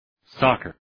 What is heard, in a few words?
soccer